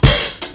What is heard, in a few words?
KIK, Dirty Hip Hop Kit; drums full kits; style kits